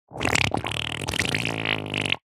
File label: human fart long very wet squelchy bubbles; zapsplat